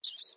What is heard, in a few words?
mice grunt; minecraft; technic